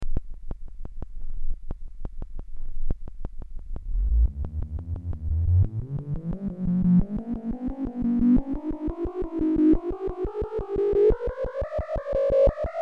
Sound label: synthi e noise